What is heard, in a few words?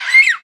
Bird Cage Idle; angry birds rio